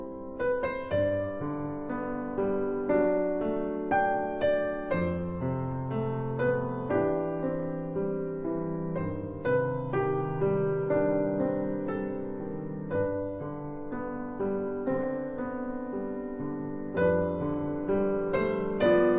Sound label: Piano